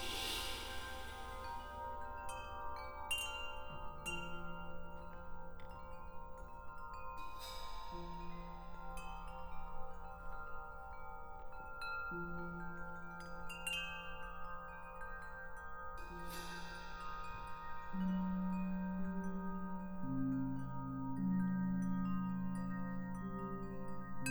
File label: Chimes Binaural